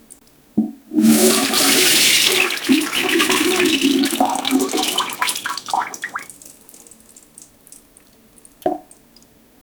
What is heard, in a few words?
farting and pooping in to, toilet